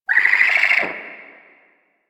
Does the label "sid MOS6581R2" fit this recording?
No